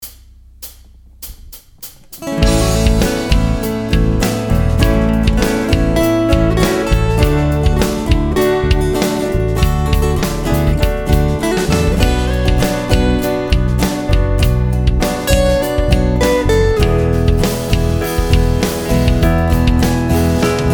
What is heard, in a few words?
32 bars with fills and lead